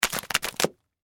Splatter Mud Debris, Texture, Splatter, Mud, Debris, Drop; Drops; Objects; BlastWave